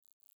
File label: Myotis dasycneme, batcalls